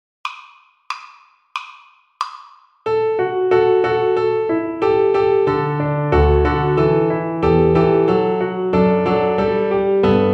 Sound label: String Gym, Piano Accompaniments